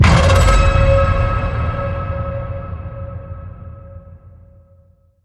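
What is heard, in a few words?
hit horror; Kinetic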